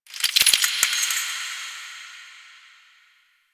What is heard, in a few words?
Fortress Chains Small; Warframe; Grineer Fortress